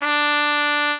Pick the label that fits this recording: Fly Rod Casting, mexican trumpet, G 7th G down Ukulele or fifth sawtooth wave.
mexican trumpet